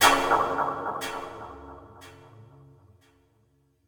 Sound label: jumpscare; content warning